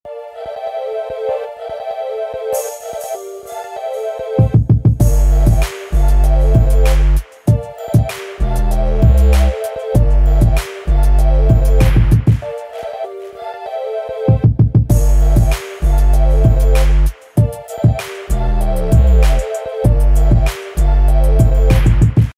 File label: playing drums; shahrukh khan; audio meme